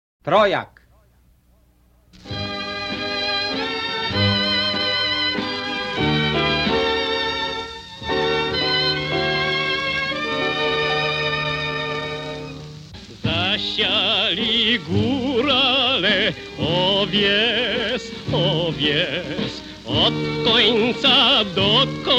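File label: trojak instrumental with vocal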